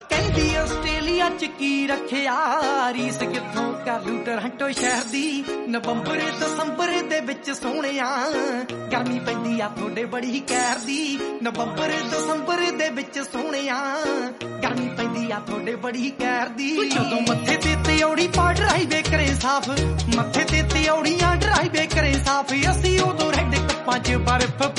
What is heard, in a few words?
punjabi song